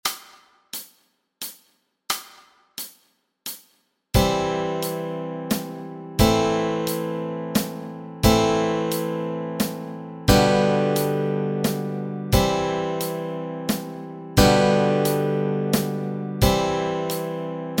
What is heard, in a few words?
The Star Spangled Banner chords, Easy Guitar and Ukulele